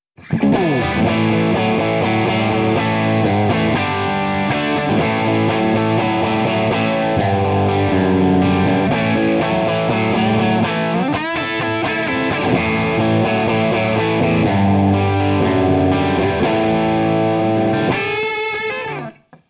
audient sono dist

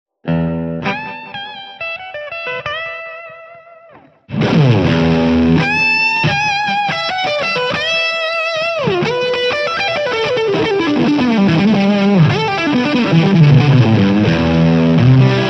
Tube Clipper mini

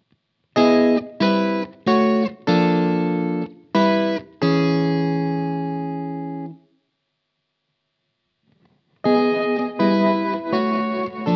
tremble

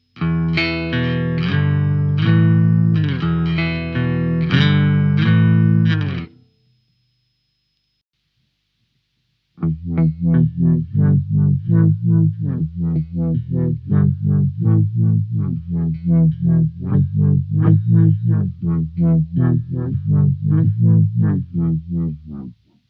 floating phrase

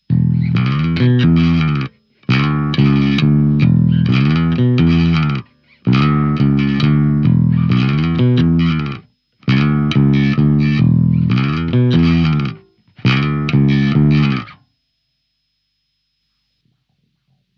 Instasynth

